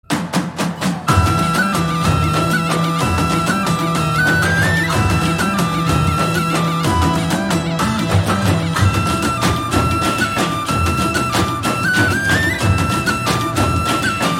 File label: Love Flute